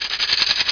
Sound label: Maracas